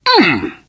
hurt minor, biker; source engine; left 4 dead 2

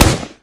sticky bomb launcher shoot, weapons; tf

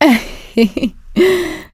laughter, survivor, voice

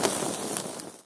fire small loop; video games; ambient; source engine; portal2

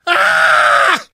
hurt critical, survivor voice

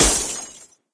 hit glass; weapons; source engine; dod

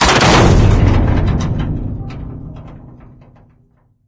van impact; ambient; source engine; episodic; intro

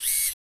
gunslinger inspect finger move, weapons

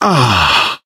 pain relief sigh, survivor voice, manager

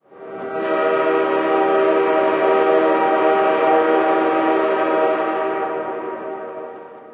train horn distant, alarms; ambient; source engine; hl2

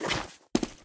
springrider getup; video games; player; taunt; source engine; tf

